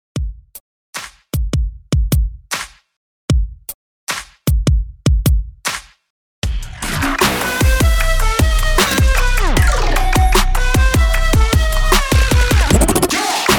MIXSHOW REDRUM 76 BPM

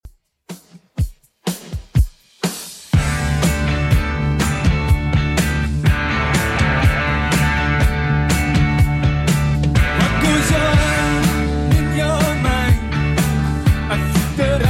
70s Redrum, 123 bpm

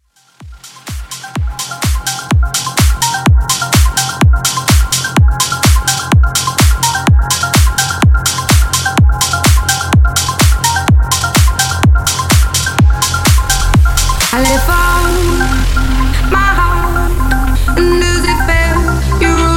Hybrid Bigroom 126Bpm Clean; djyan

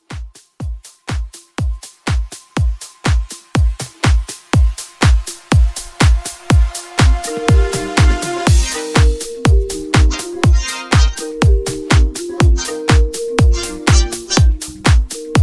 80s house pop, 122; Re-Drum

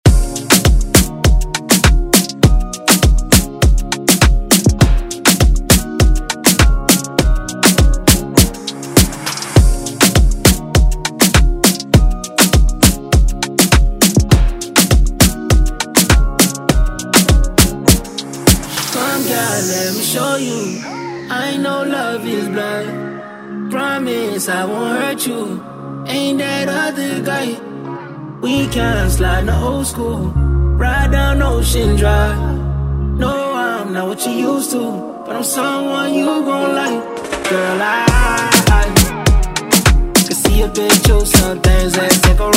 Moombahtom Redrum Intro

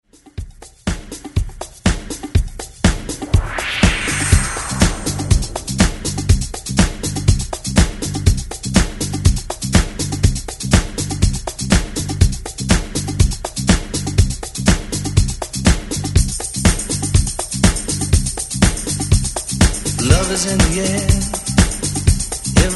70s Re Drum 122 Bpm Clean

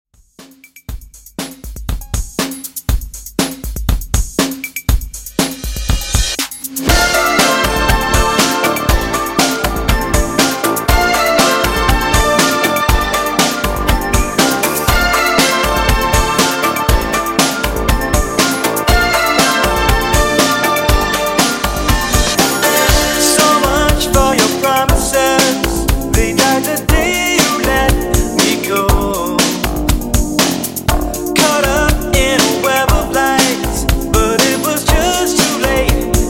80s PopRock ReDrum 120Bpm

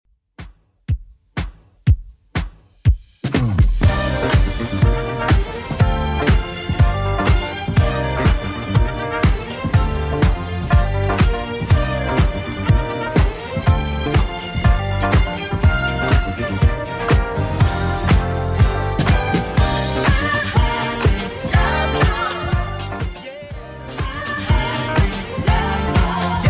80s Redrum, 122 bpm

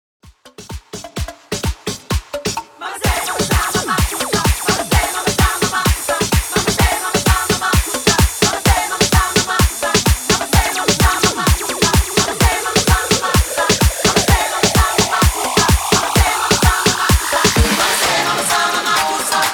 EDM bootleg, 128 BPM

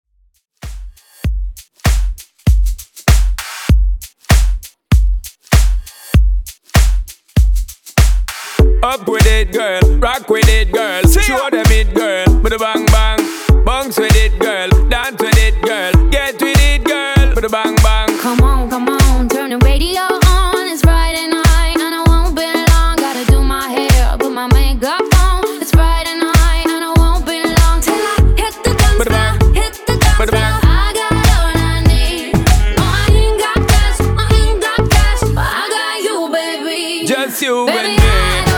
Re Drum

